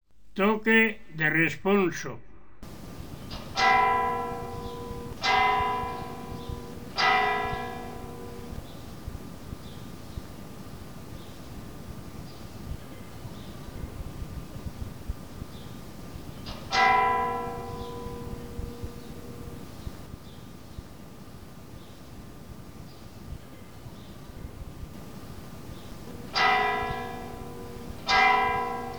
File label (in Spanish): Toque de Responso, Campanas